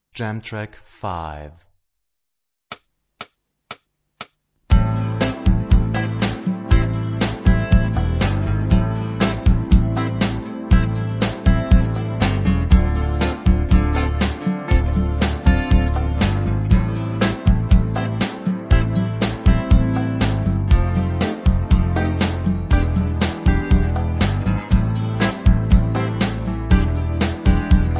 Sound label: Rock guitar; Rock gt Secrets